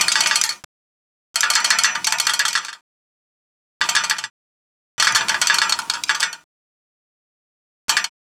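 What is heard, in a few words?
metal rattle tap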